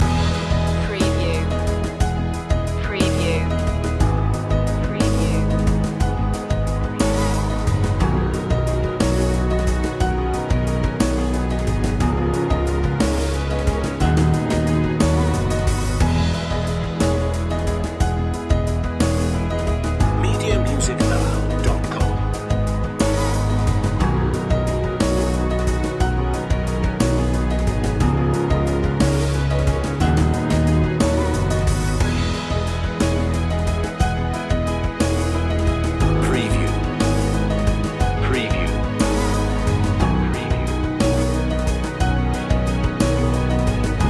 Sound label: underscore loop